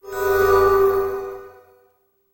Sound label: sparkle; escape from frankensteins tower